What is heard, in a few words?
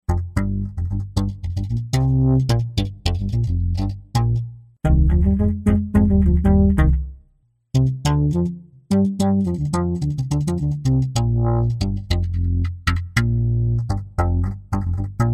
Expressive E Osmose Bass